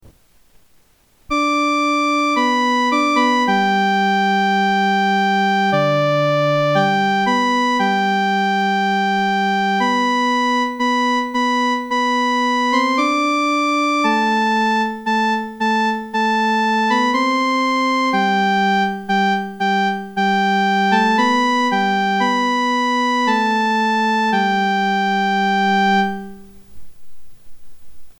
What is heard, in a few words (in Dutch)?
muziek herfstliederen gluck auf instrument